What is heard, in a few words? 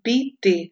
stumble